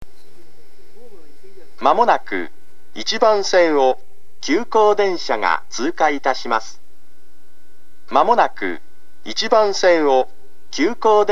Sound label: tana pass express, tokyu station